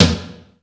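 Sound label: GATE tom; Crypton - DrumBase5000; GATE KITS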